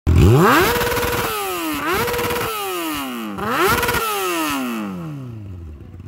kawasaki 636 rev limiter

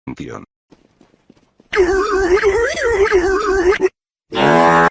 long a brain fart

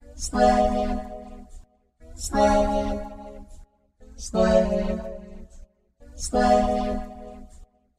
slay remix

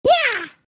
yaaaaah

